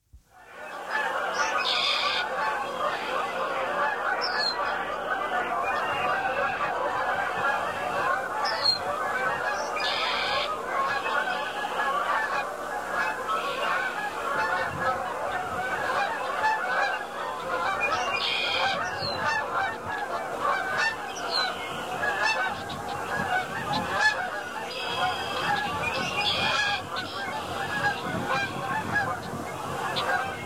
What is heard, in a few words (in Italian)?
CANILE IN CAMPAGNA